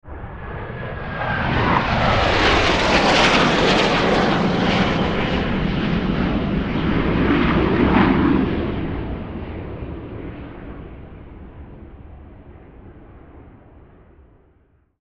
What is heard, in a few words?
Jet Fly By Pass Overhead; Fast Rip; Military; Airplane; Warfare; Blue Angel; Airplanes; Blastwave